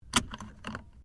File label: Car Toyota Corolla seatbelt unclip; Car Toyota Corolla 20 09; 2009 Toyota Corolla hatchback; Cars (Sound Effects:Vehicles)